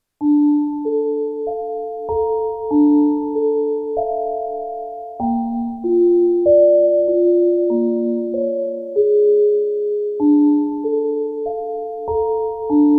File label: Vibraphone; Finding Paradise; Neil's Machine